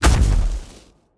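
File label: movement footstep; smite; god cthulhu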